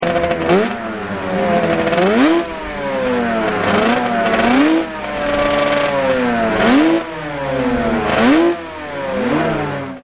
Rev Motorcycle

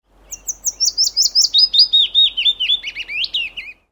Willow Warbler song

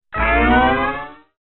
button

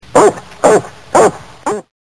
barking dog; Mono

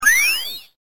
radar

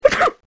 sneeze

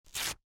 paper rip

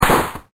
Gun Shot

